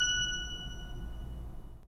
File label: 3D Organs Pipe Mix Celeste